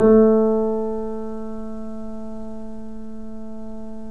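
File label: A4, Bösendorfer, 3D Pianos; E-MU Producer Series Vol. 5; 3-D Audio Collection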